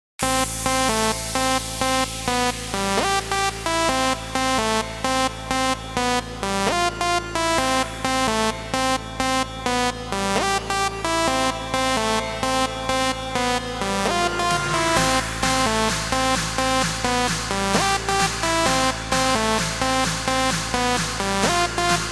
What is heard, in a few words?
drop